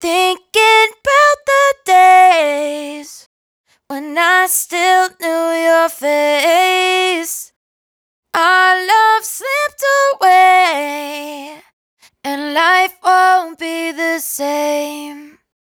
hook 115 D, Dry Vocals; Raw Vocals; Thinking Bout; Slate Digital Omnivox Vocal Sample Pack

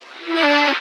vocal blackout D; Slate; AURORA Sample Pack; Vocals

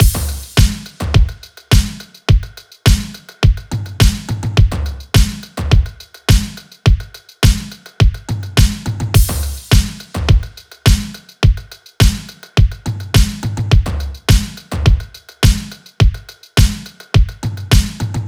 drums 105, Drum Loops, Full Drums; F40; Slate; MIDNIGHT Sample Pack

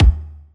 kick one shot, drums; Pop; Slate; SD PASADENA Pop Sample Pack

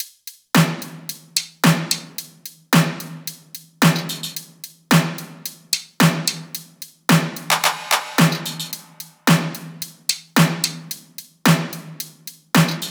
top Drum Loops 110; SD MIDNIGHT Sample Pack; Slate

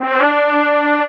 vocal journey D; SD AURORA Sample Pack; Slate

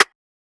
perc classic; Hip-Hop; Drum One Shots; SD CAICOS Hip-Hop Sample Pack; Slate

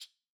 rattles Single Hit; Slate; SD SEISMIC Drum Sample Pack

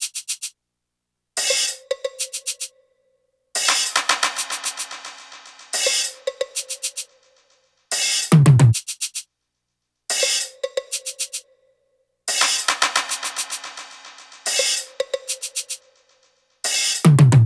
perc, Drum Loops, Top Loops, 110; SD MIDNIGHT Sample Pack; Slate

